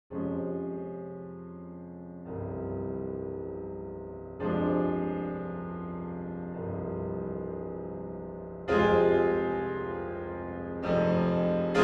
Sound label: piano concerto start